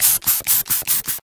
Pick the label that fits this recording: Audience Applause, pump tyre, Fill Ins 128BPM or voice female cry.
pump tyre